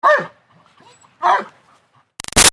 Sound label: Dog German Shepherd Barks Double; Animals; Dogs; Big Room Sound